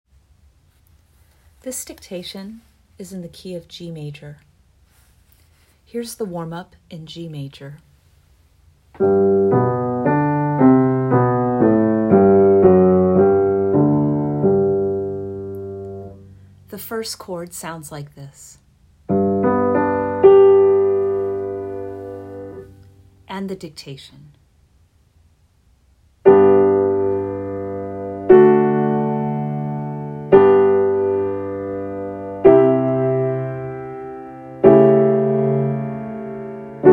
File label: harmonic dictation audio